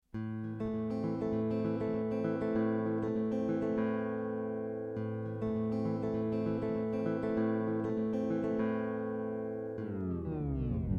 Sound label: boss rc 30 fx effects